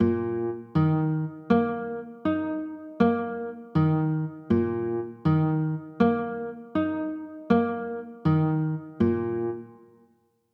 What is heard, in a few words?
e flat maj, chords